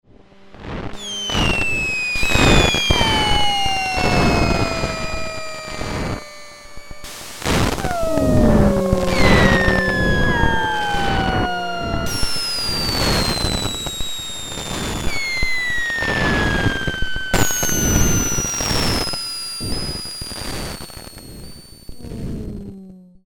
Bombs Dropping, Prophet-5; Sequential Circuits Prophet-5 (Ver.3); Factory Patch; File 3 Programs

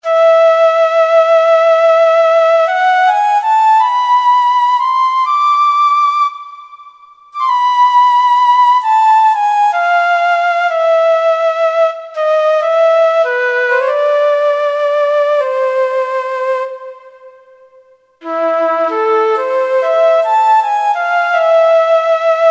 Orchestral Flute, Kurzweil K2000; Kurzweil K2000 Orchestral ROM

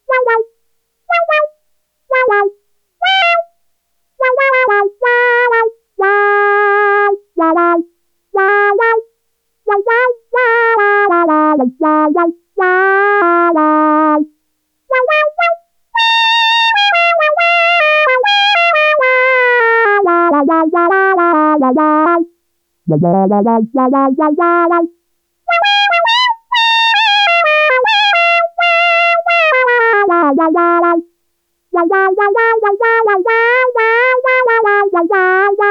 FUNNY CAT, Roland SH-101; Factory Patches

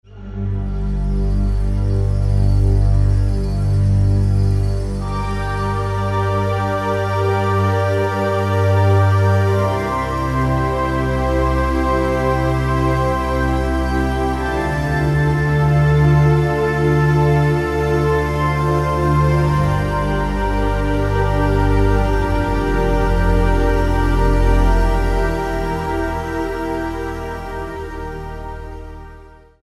Shakupad; World; Roland SR-JV80-05

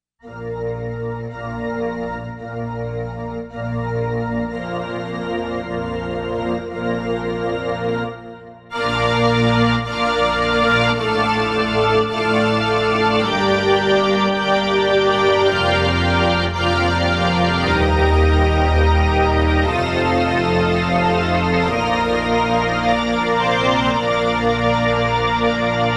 Church Organ; Roland SC-880; Factory Patches

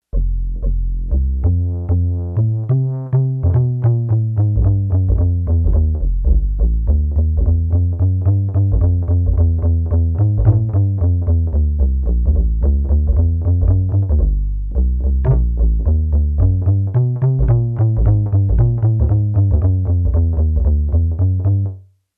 Jazz Bass, Yamaha TX802; Factory Patches; Voices

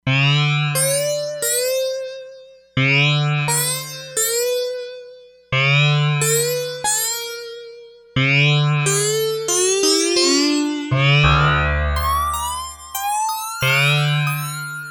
Automan Empir, E-mu Proteus-3; Factory patches

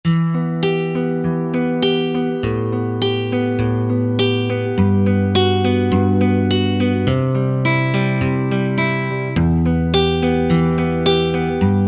Nylon; Roland V-Synth; Factory Patches; 266 Nylon Shapes

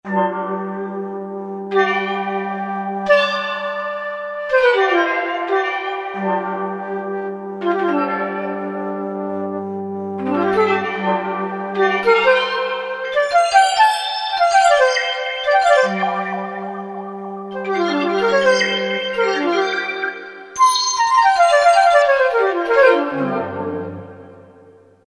Waterflute, Kurzweil K2000; Kurzweil K2000 V3

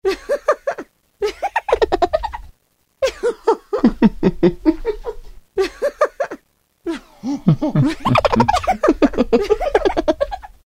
Laugh Mix; Roland M-DC1

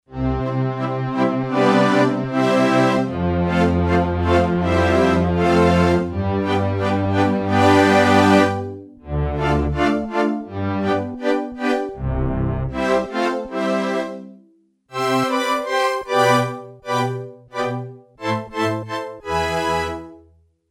Big Chord Orchestra, Yamaha TX802; Performances; Factory Patches

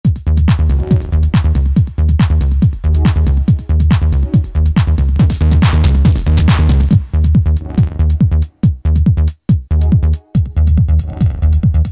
Techno, Yamaha DJX-IIB

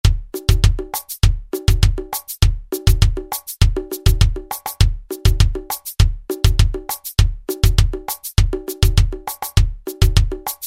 Latin, Alesis SR-16; Preset Patterns

